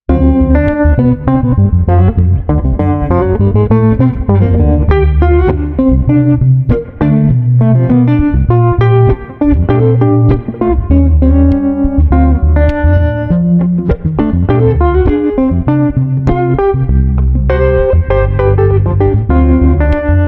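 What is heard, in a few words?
Street Guitar Bass Chillout Funk, 100 bpm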